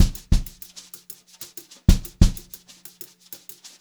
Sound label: Brushed Drum Loops; USB Soundscan vol.39; AKAI